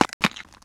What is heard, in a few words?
foot; spider